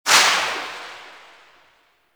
Pistol Silenced Tail; Operators; Drifter

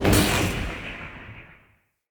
Tesla Mini Game Charge; Encounters; Ode Pollution Tower

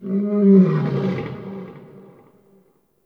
Forest Distant Creature Medium Cry, Ambience; Warframe; Grineer

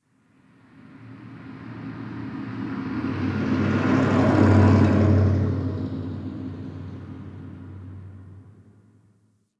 Distant Traffic H; Warframe; Ambience; NineteenNinetyNine

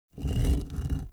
Shrine Puzzle Stag Turn; Doors

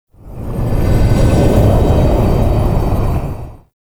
Interior Orbital Station Wind; Warframe; Tau

